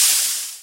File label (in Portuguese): suspensao; cpf painelveiculo